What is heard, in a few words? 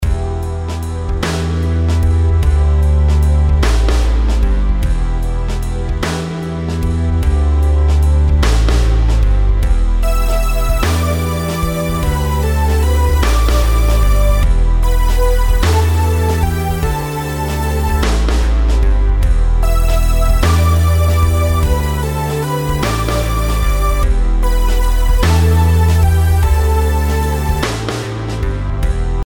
rhythms compound 8s